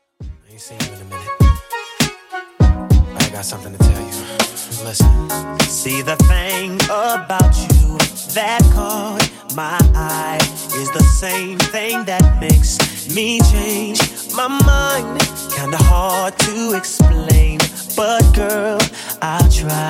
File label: Throwback Rnb Redrum 100bpm